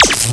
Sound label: laser sound; cstrike